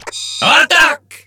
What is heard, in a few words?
attack